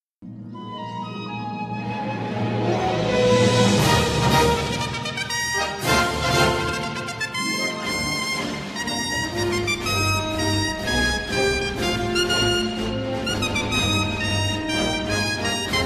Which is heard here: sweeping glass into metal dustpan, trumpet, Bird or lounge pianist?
trumpet